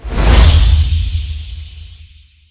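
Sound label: smoke, Magic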